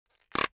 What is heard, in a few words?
plastic slowly pressured